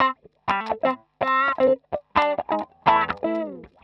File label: 125 X wah A, wahwah; PowerFX - Nu Jazz House